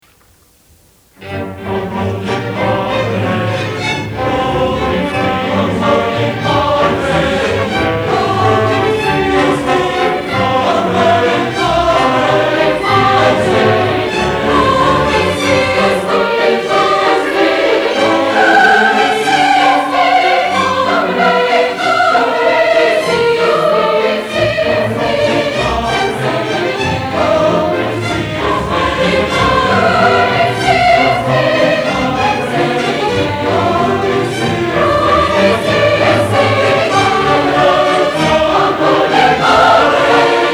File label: Mozarts Requium Hostias